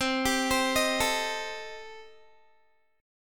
C Minor 6th Guitar, chord; Cm6 arpeggiated; 20,22,22,20,21,x

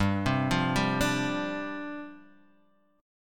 G 7th Suspended 4th G down; G7sus4 arpeggiated

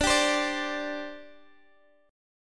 D 7th Suspended 4th, down, Guitar, Drop D

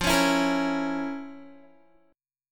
F Major 7th Suspended 4th Sharp 5th, Guitar; FM7sus4#5; 13,16,14,15,13,x; 4E,4A,5D,5G,5C,6F; strummed

